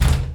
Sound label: stop; Caribbean Beach Poker; wazdan